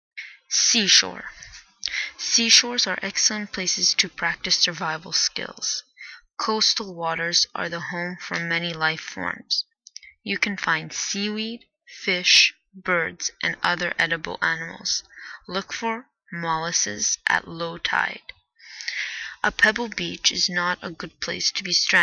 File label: seashore